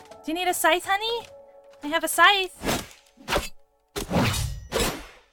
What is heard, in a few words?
scythe; mirishalyn